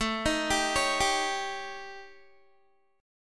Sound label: A 7th Flat 5th A, Guitar; A7b5 arpeggiated; 17,18,17,18,19,x; 4E,4A,5D,5G,5C,6F